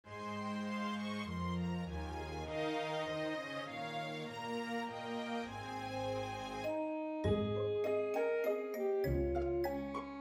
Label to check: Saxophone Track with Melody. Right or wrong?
Right